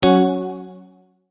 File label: multimedia tone exclamation; McKinney Sound